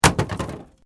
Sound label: Metal Light Movement Minor